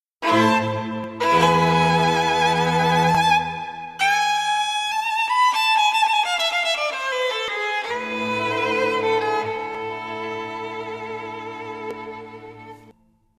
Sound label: Tragic Violin